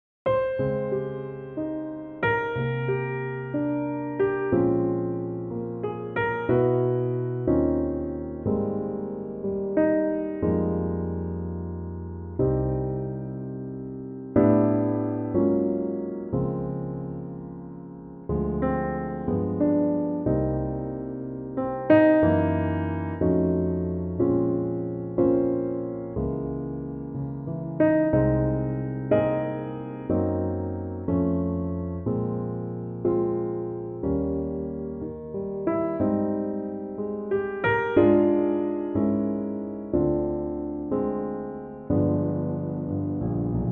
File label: Ab piano